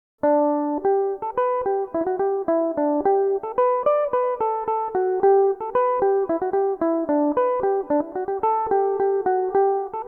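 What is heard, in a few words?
G major, irish mandolin, tunes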